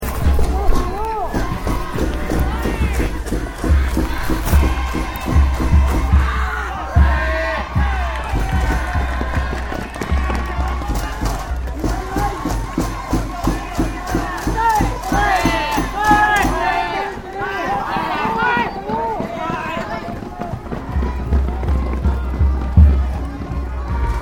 Baseball Japan Highschool Game Bands Cheering; Baseball (Sound Effects:Sports)

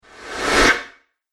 Positive Whoosh Short Tail; Whoosh Sound Effects

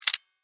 Steampunk Switch; Steampunk Sound Effects; Steampunk (Sound Effects:Science Fiction)

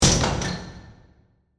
Mechanical Stamp, Press; Scifi; Science, Fiction; Spacecrafts; Blast Wave

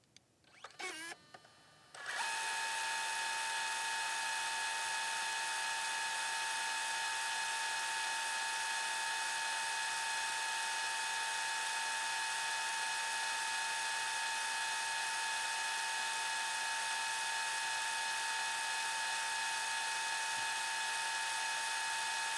Camcorder Fast Forward End Of Tape, Sony Mini Dv; Camcorders; Blastwave; Technology

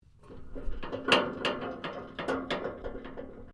MECHANICAL SUSPENSION METAL SPRING WOBBLE SHORT; Variou smechanical sounds; Objects

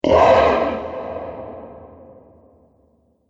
Fire Breathing Monster; Monster Sound Effects

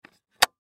Thermostat Cover, Thermostat Cover Close; Household

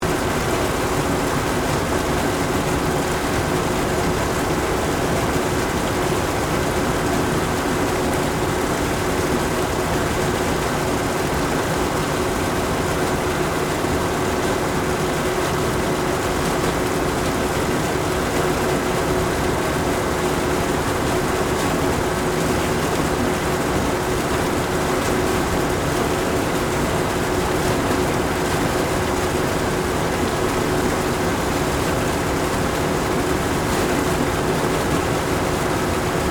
Ice Motor, Rink, Refrigeration, Filter, Run, Loop; BlastWave

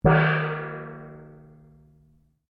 GONG 8 INCH MF, Cheap brass gong; Gong Chau; Cymbals; Sound Effects:Musical; Foundation3

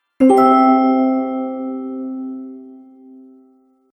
Elf Harp Magical Gliss; Magic Sound Effects

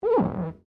GLASS SQUEAK FINGER

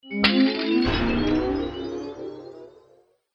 Stonewall Teleport Exit, Magic Sound Effects

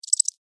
Insect Cricket Chirp Single; Insect Voice; Animals

